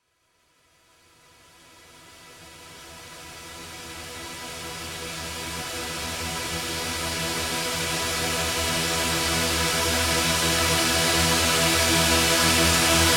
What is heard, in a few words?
140BPM Pad E, Melody Fills; Alpha; 8Bars; Vengeance Samples